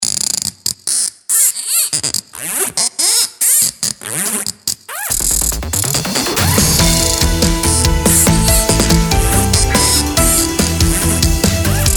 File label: ringtone; hellermanntyton